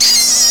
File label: Screams; Home Alone